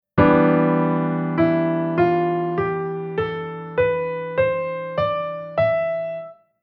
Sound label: Chord Chord Scale Major